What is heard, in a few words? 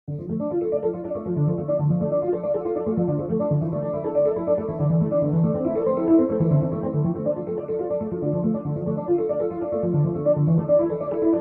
Arp; atomic heart ost 2023